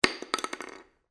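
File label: cartridge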